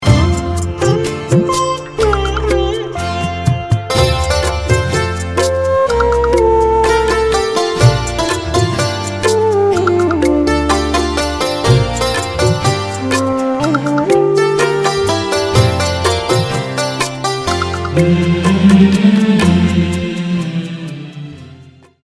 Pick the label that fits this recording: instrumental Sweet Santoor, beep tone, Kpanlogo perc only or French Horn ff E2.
instrumental Sweet Santoor